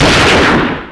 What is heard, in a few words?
fire, weapons